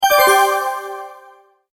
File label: multimedia tone alert